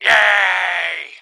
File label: yaaay; DBZ Abridged